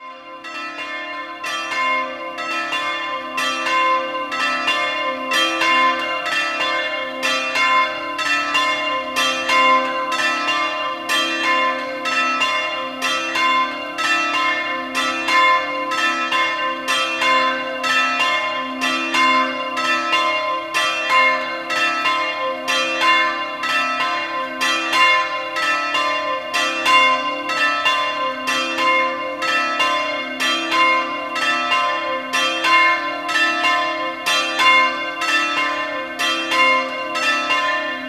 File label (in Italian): Villa d Arco, campane